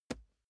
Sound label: wood impact small lash soft